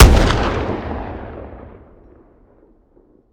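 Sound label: weapon pistol mag fire player atmo ext; gmod; viper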